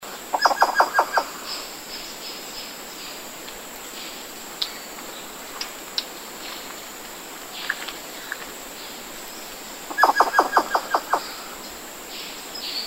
Protoxerus stangeri, African Giant Squirrel; Sangha; Rod Cassidy

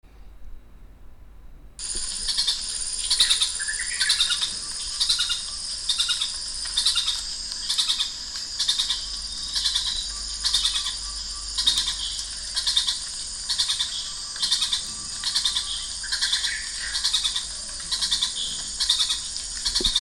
Funisciurus cf pyrropus, Cote d Ivoire; Banco; Bruno Boedts

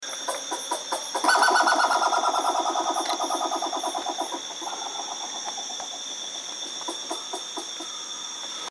Protoxerus stangeri, Giant Squirrel; July; Dja; Schweinhart

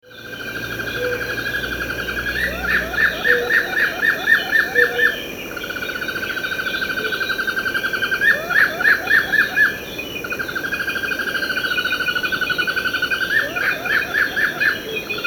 Funisciurus pyrropus; Abidjan; Macaulay; Cornell; Dolly Minis

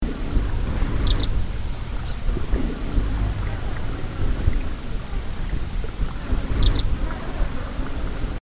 Alashan ground squirrel Spermophilus alashanicus; Yolin Am

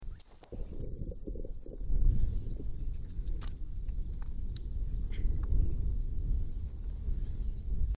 Daurian pika Ochotona daurica song; Khurkh

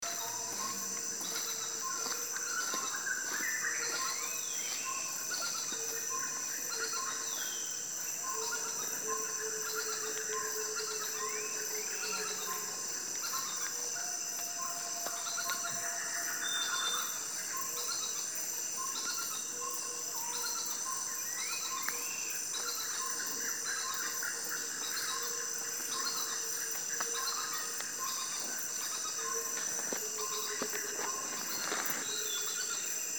Funisciurus pyrropus; Ankasa Ghana; Daan Drukker

